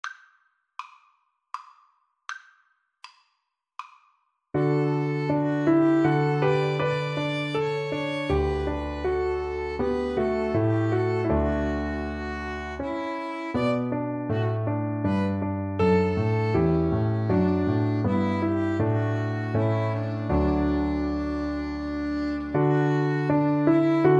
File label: Piano Trio